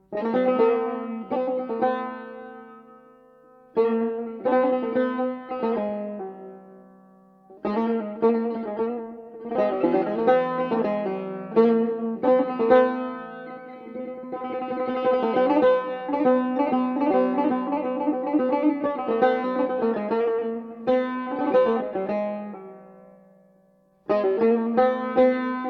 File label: Tar Solo